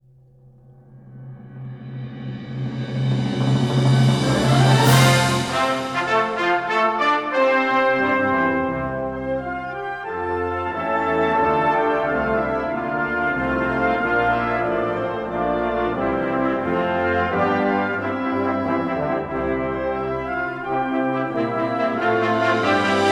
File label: instrumental version; majulah singapura; SAF recording april 2022; national symbols